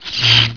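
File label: electric; tnpdemo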